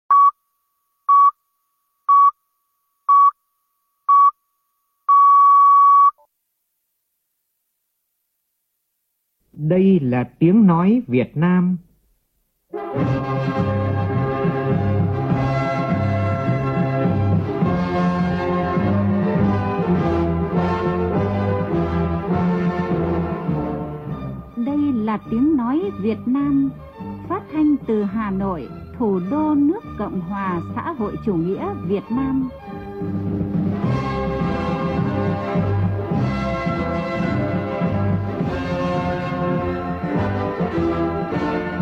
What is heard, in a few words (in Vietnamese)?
bao thuc phat thanh